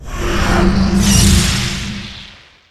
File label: spilit blow; RPG Maker; Legend of Heroen v1.20